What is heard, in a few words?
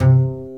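String, contrabass pizzicato; String Master Series; Cb Pizzicato; Cb Pizz 3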